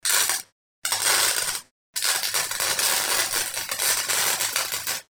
electro welding

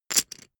poker chip stack rattle short

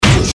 impact

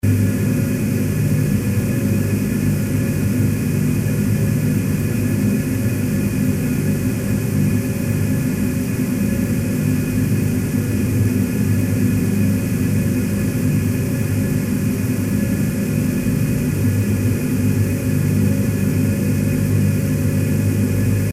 cooling fan noise warm noisy

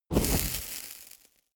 torch small inflame

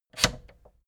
fader plastic scrape single down halfway fast

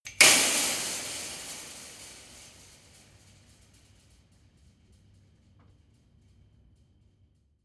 metal impact fence long

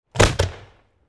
fireworks single shorts

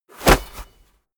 basketball hit basket

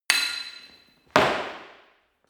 sword hit sword tackle

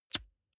lighter wheel spin

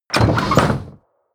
door wood open

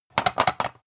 pan rattle

